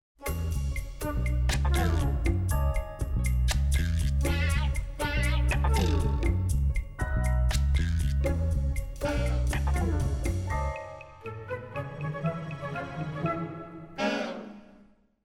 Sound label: jazzy; astro boy